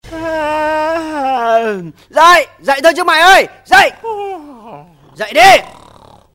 Nhac chuong bao thuc day di hoc

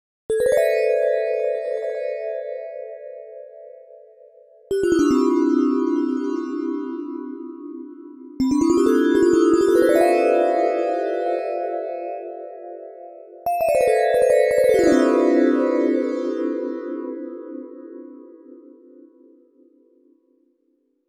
am thanh ma thuat anh sao lap lanh

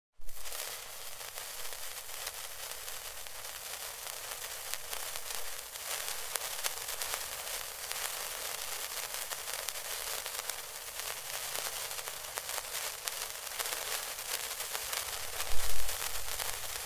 tieng dot phao hoa sinh nhat cam tay

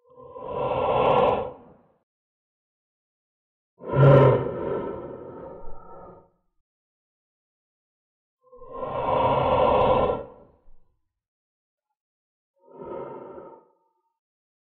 tieng hoi tho tieng ngay ngu cua quai vat

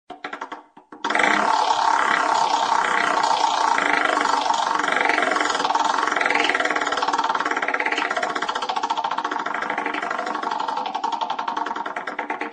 tieng quay banh xe may man; chiec non ky dieu

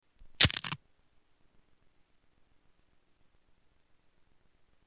Tiếng ăn một miếng khoai tây chiên giòn rụm

